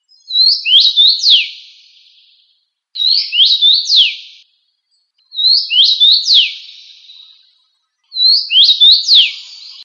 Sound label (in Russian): chechevica